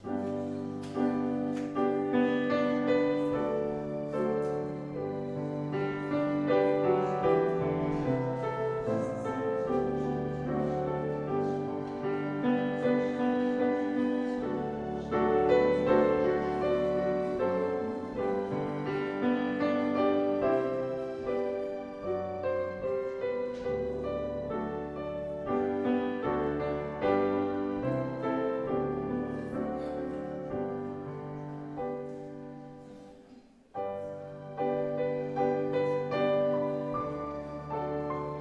Vorspiel

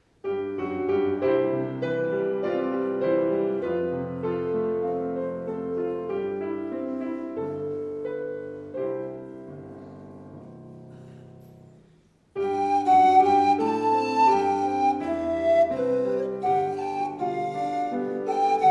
Vorspiel

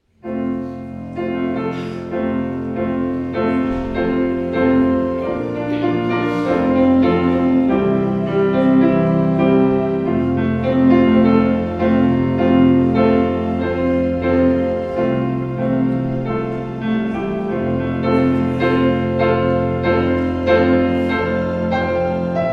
Vorspiel

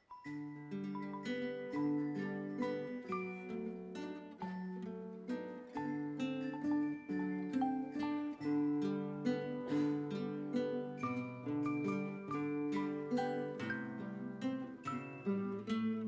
Kinderorchester

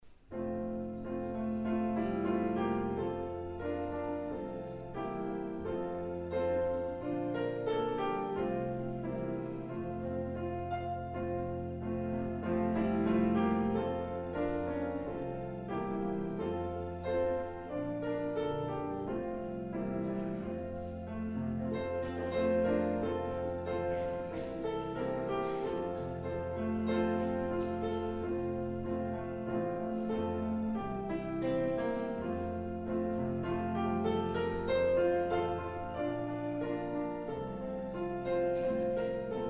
Vorspiel

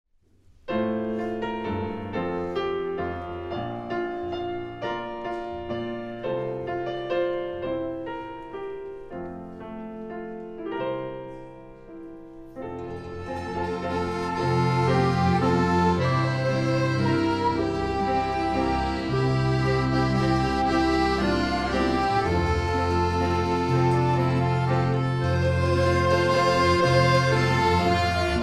Vorspiel Orchester; Suesser die Glocken nie klingen

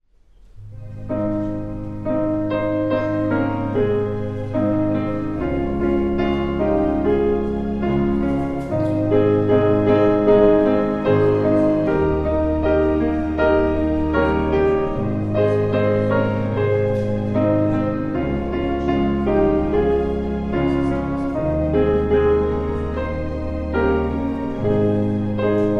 Vorspiel

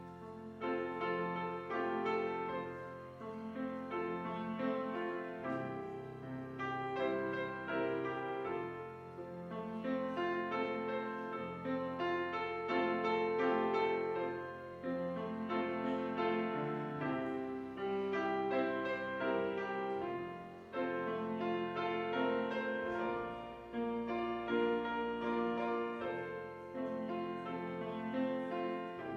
Vorspiel

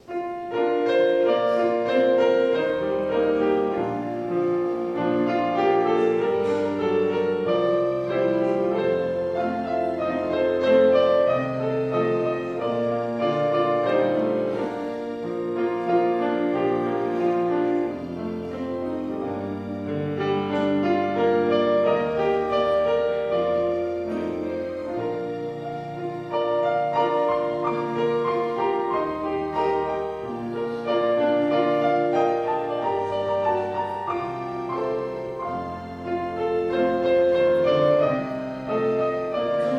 Vorspiel

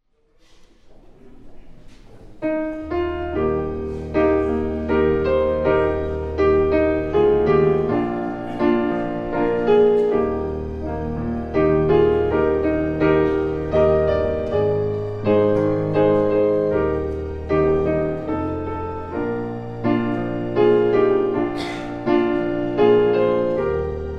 Vorspiel

